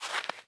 细雪踩踏声

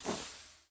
蜡烛 熄灭声

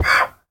驴受伤声

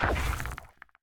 脚步声; 监守者

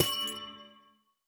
紫水晶簇破坏声

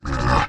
骆驼环境声

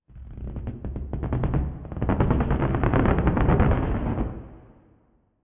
诡异森林嘎吱声; ambient

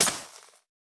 可疑的沙子破坏声

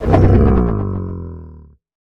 劫掠兽眩晕声

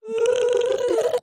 炽足兽撤退声

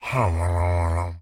唤魔者待机声

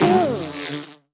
蜜蜂死亡声

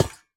试炼刷怪笼踩踏声

